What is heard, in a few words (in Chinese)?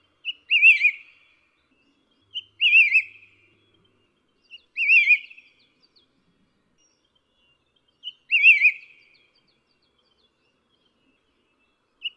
台灣噪眉; 金翼白眉、玉山噪眉; 噪眉科/留鳥